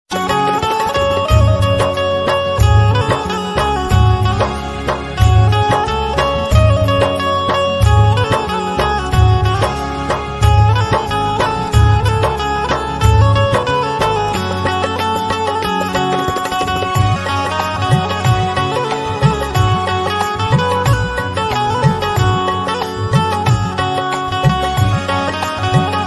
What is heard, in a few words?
old is gold instrumental ringtone song ringtone love ringtone; raja hindustani